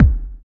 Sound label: DR. LOOP, DRUMS; X-Static Goldmine 3